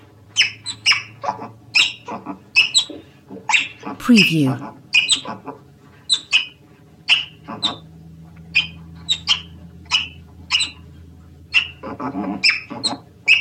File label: oriental small clawed otter; Rai ca vuot be